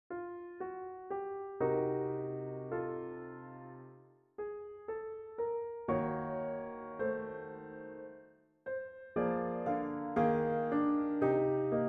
An example with major/diminished chords on VII in a minor key